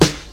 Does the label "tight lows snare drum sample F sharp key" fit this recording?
Yes